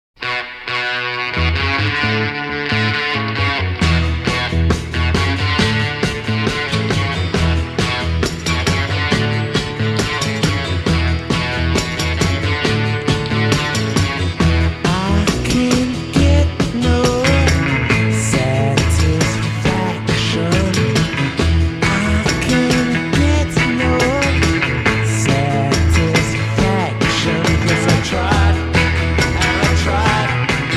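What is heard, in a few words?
electric guitar intro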